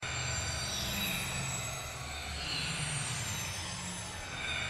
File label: Cave Music; children of morta; HartoPoort